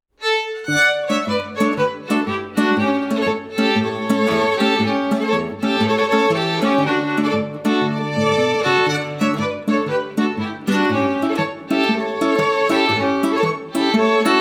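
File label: Sarstein Polka, Violine & Gitarre; volksmusik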